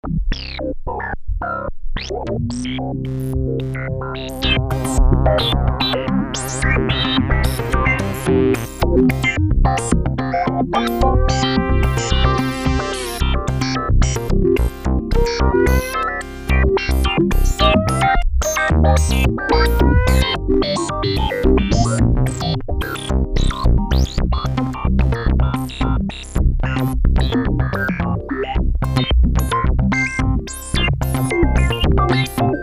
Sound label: Electronic